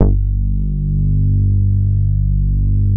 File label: VCO bass G2; SE-1 BASSES